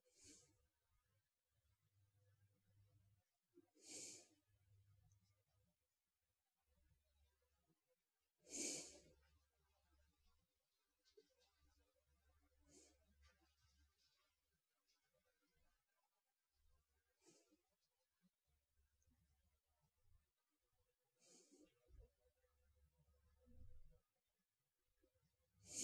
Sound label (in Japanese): 寝息